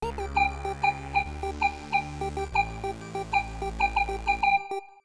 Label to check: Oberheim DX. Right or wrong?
Wrong